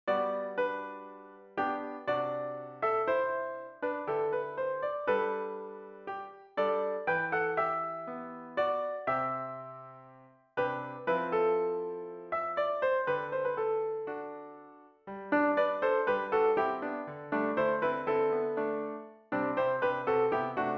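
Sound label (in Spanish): Sopranos, MIDI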